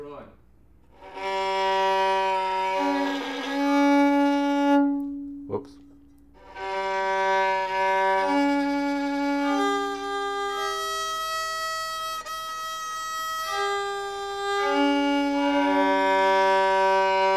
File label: Violin; radio 101